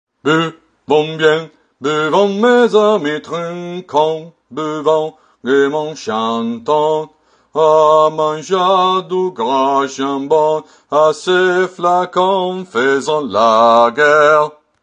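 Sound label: Tourdion bass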